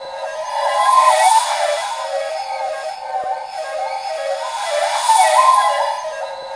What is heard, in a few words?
SPIRAL WIND; Spectrasonic Distorted Reality 1